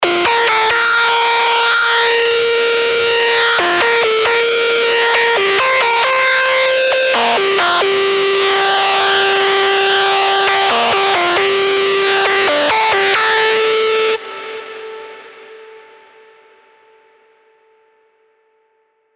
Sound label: LD, Sylenth1; Frozen Bloom; alonso 18 year anniversary pack